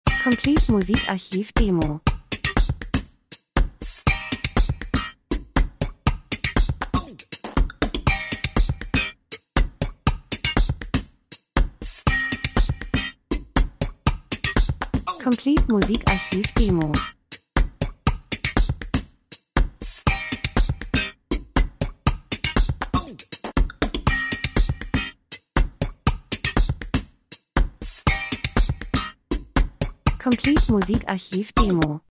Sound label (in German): rhythmus; Stimmung; drums